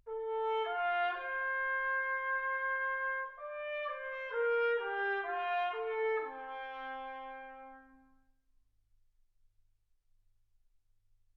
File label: trumpet